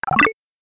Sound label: xmb move alt